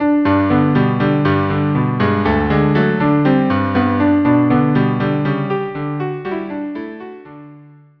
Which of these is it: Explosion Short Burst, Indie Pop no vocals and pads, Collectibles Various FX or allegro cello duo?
allegro cello duo